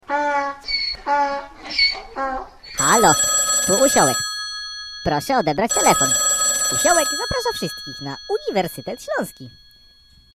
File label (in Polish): dzwonek